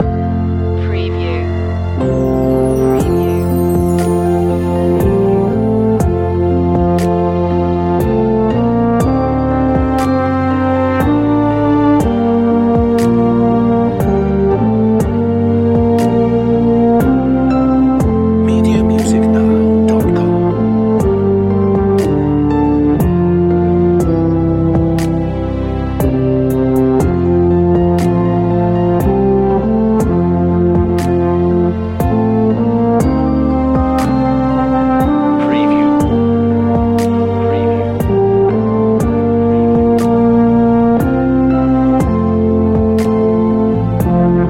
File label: away in a manger euphonium feature